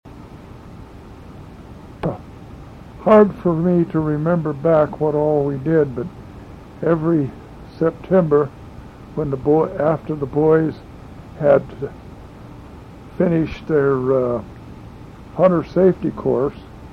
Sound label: Shotguns, dove hunts; Imperial Valley